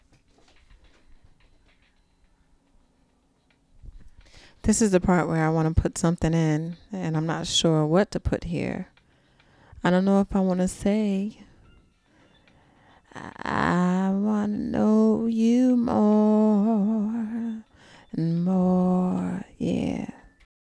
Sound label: diva